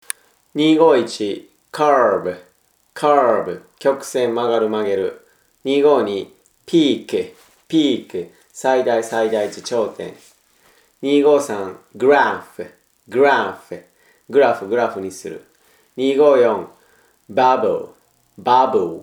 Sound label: tango